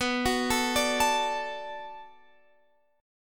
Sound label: B-Minor 7th B down Guitar Drop D